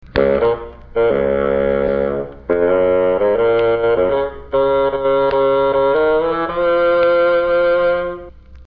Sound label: bassoon; woodwind sound clips